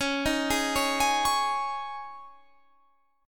C sharp Suspended 2nd, C sharp, Guitar, Half Step Down